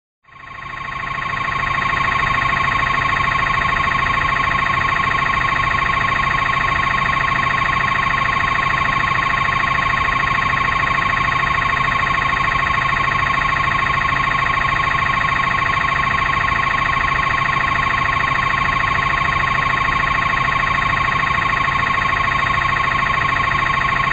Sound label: Electronic Machine continuous engine beep